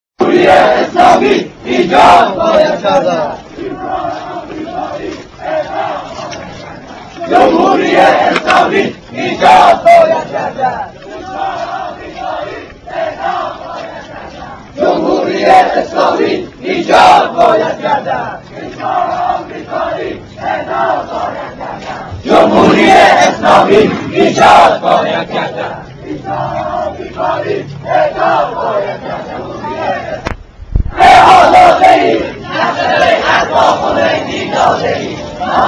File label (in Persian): سرود انقلابی صدای تظاهرات